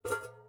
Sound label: ui pickup metal thin hollow; Rust